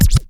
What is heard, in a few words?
Scratch; Double Scratch; SPD One